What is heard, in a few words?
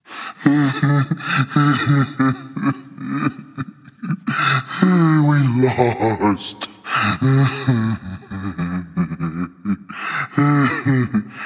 boohoo; smite; voicepack; kumbhakarna